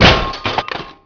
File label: Vehicle Impact; Playable Tutorial Level; TR2